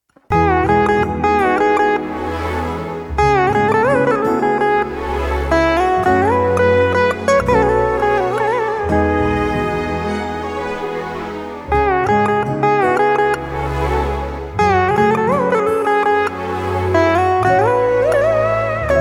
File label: Instrumental